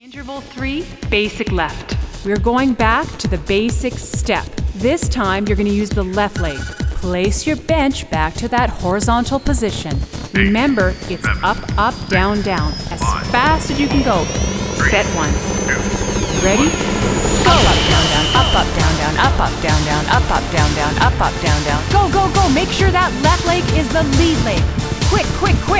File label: Cardio Workout